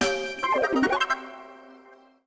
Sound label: enter playground